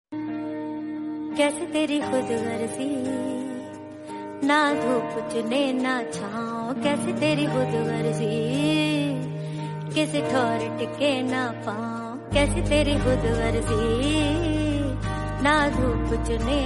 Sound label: song, hindi